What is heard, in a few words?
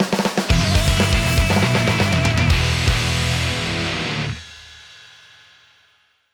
Win Jingle